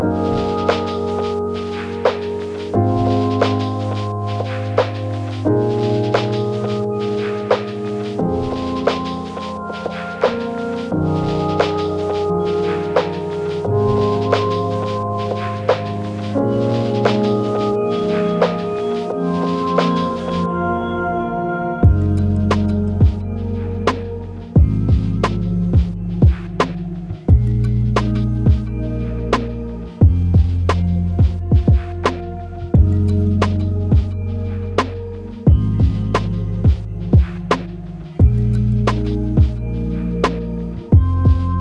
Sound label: BGM lobby; musaium